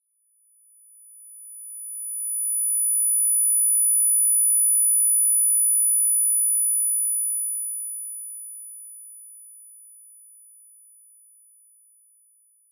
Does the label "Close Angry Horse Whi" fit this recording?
No